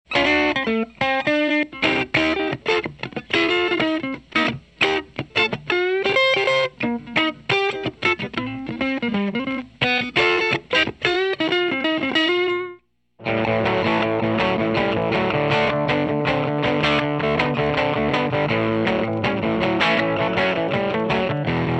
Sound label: dirty Blackface Deluxe